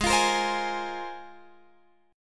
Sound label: Ab 6th Add 9th Ab, Guitar, Open F; x,15,17,17,15,15; strummed